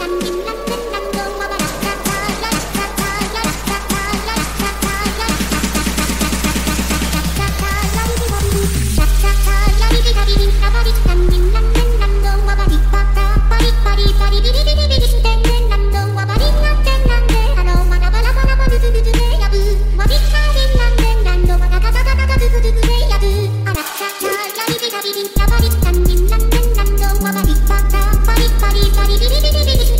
Bass BOOSTED

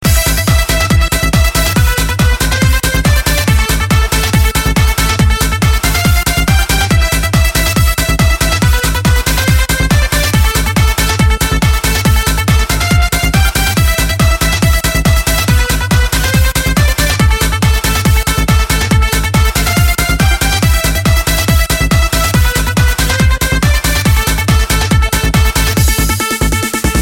Trance Club Mix

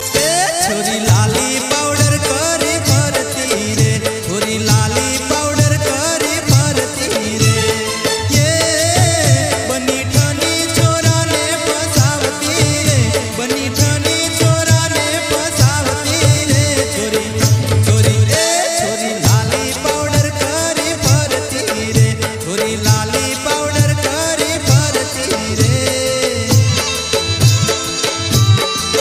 Funny Gujarati Song

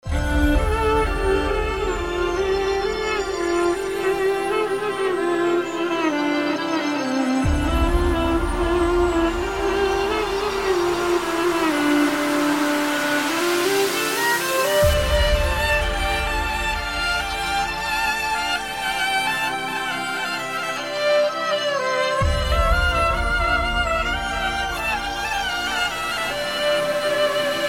Flute Mix

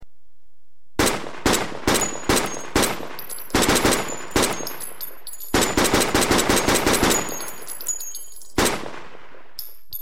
Ppsh-41 Gun

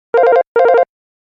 Office Ringtone

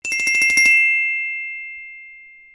Ringing

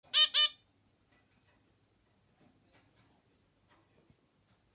Zebra finch beep

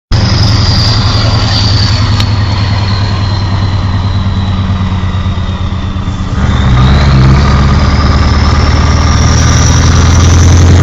Scania V8; Scania 142

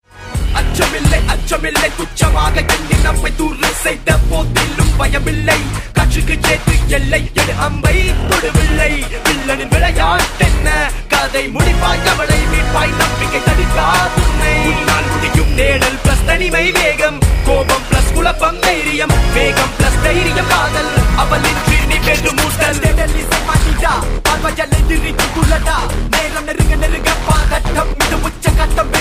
Rap Song

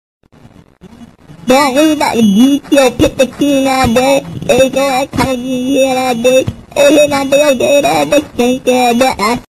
8-bit

